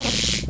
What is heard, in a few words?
Transfer Failed; Anarchie Pro 3.0